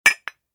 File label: Beer mug cheers